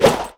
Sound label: sword swing, weapons; tf2classic